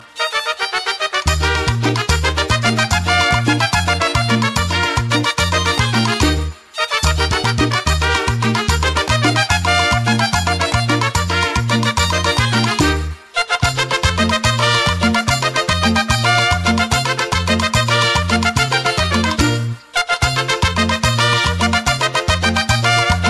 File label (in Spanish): musica mexico